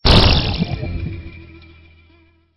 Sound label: Stinger; STINGERS